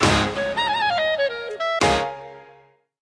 freespin Outro; piggy riches

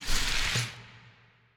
nudge; druids dream

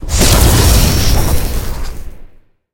free spin outro

